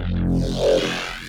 Reese design, bass

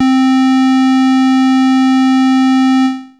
Techno leads, hits and riffs

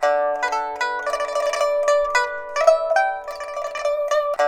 PIPA, 90; INIS - Opium

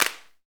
PRC XCLAP, Hand Claps; Club-50 - Foundations Roland; Snaps

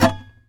SAZ TAP; Spectrasonics; Hans Zimmer Guitars VOL-2

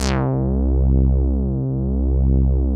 DRY BASS; Masterbits; Dance Trax

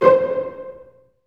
STR SEC, ORCHESTRA; Roland - LA Composer vol. 1

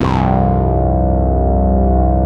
QUACK CHORD; InVision Interactive; Keith Emerson; The Most Dangerous Synth and Organ

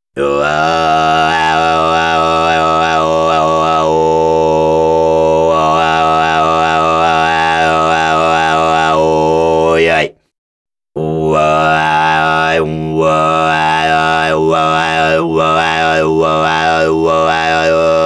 Didgeridoo And Overtone; Good Stuff Audio

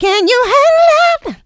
VOCA, Houseworx

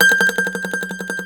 MANDOLIN

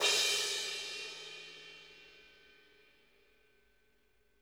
CRSH, CRASHES

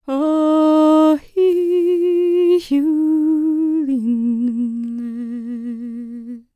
MOURN, CELTIC, World Voices; ILIO; Vocal Planet VOL-5

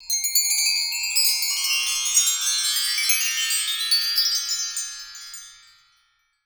BRASS C, TREE BELLS; Voice Crystal - Steve Reid Definitive Percussion

